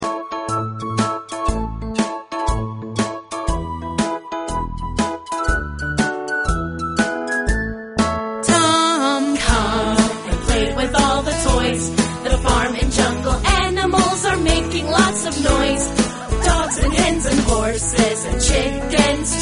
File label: tom